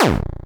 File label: my damage